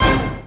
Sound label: stab; Zero G; Man Machine